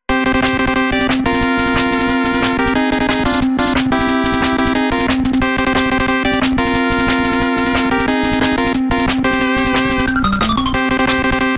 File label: mmsoundtrack; Mega Man 2; gamesoundopedia